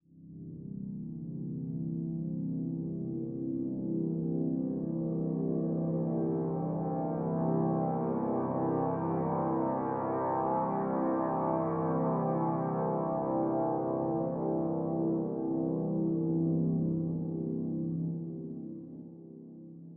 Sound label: pendulum loop